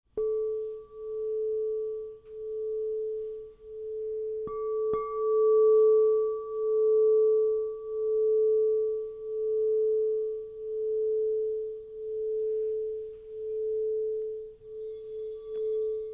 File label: Crystal Tones Singing Bowls